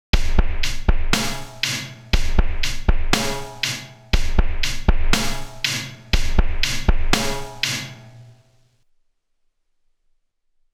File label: drums